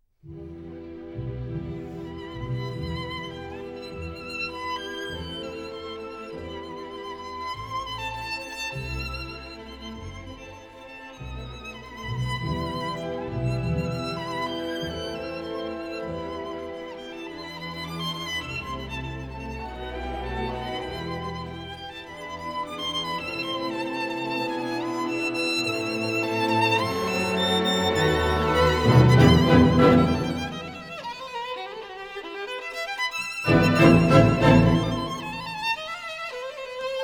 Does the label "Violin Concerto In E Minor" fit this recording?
Yes